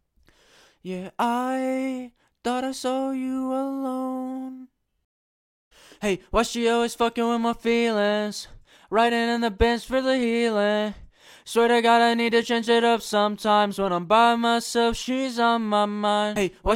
pop rap sing 186 Bbm

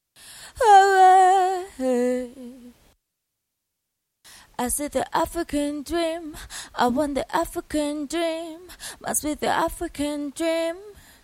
pop sing female 107 DM

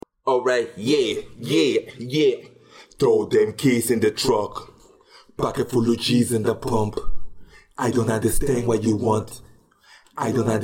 hip hop 100 Am, Acapellas

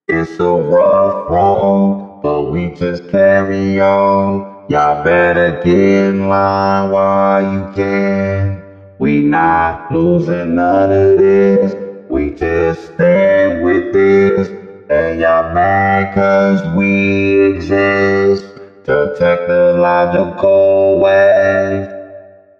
Hook hip hop 98 Gm, Acapellas

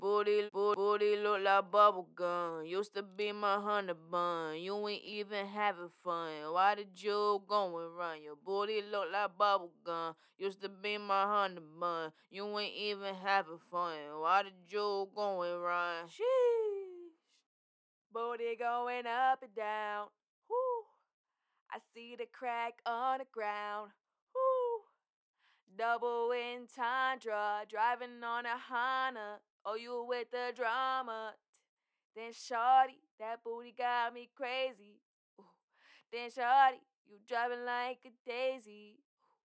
HH 125 Cm

